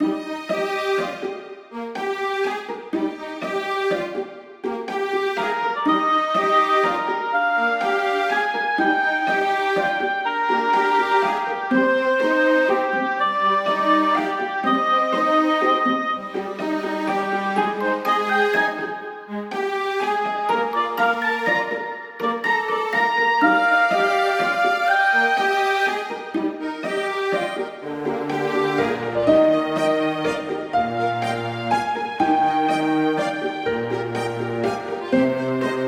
Dungeon Kingdom Village

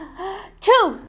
Sneeze

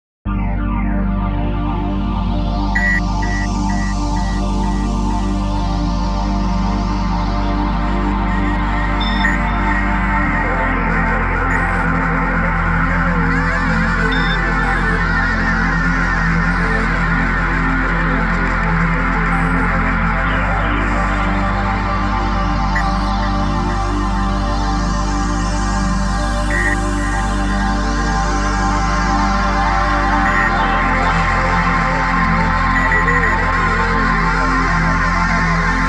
Ending; milestone sound collection; Illegal Function

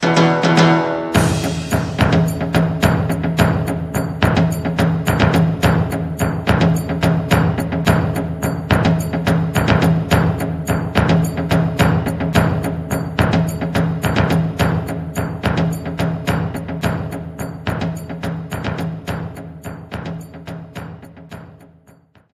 Jingle Draw; Taiko no Tatsujin DS: Dororon! Yokai Daikessen!!

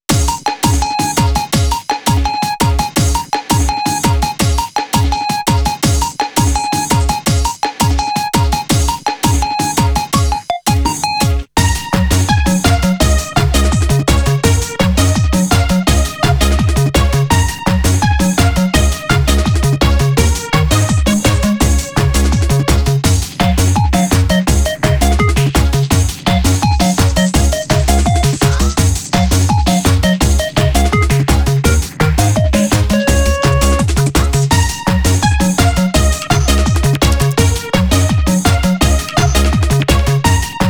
Theme (Karaoke); Ape Escape Pumped and Primed; Piposaru Theme

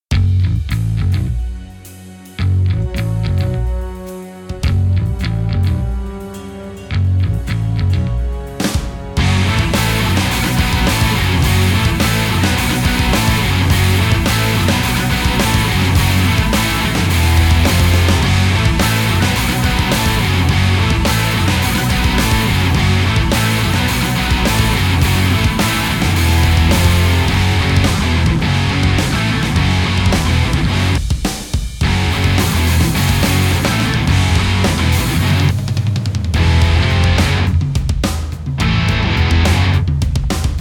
Radio Music; let it die

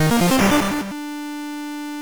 Song Selected; pop'n music GB

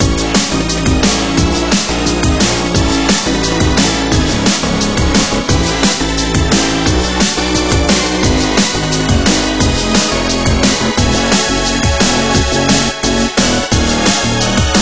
Battle Loop

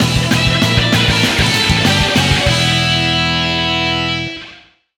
Battle Lose; beyblade evolution gamerip

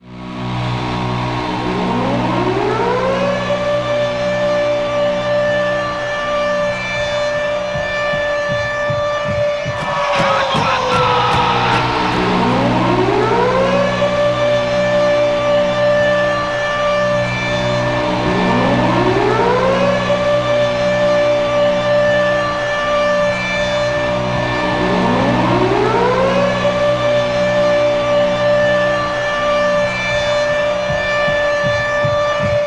Siren Repeat; final fight streetwise 2006; Pulse of Maggots Siren Repeat

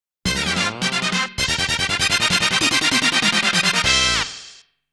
START FANFARE; SPEED & BATTLE TRAX

